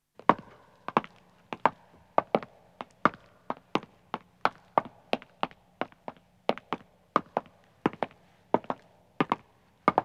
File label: Stone Walking, 2 Men Start And, Footsteps; BBC Sound Effects Library